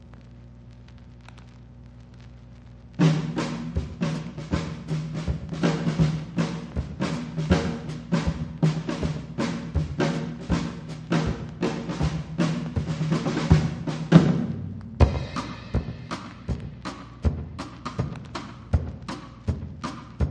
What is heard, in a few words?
Drums